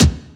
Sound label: Kick; 街角魔族